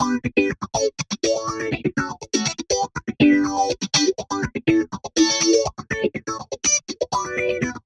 guit orig

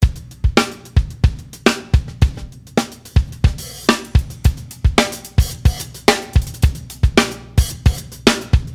drum loop moved upfront

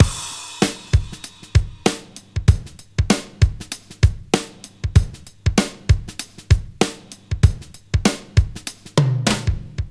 drum loop subtle glueing

